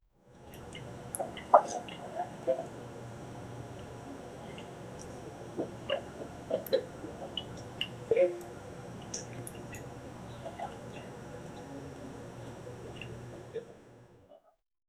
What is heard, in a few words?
light sizzling chef talk, food preparation